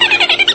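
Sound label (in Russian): хихиканье высокое детское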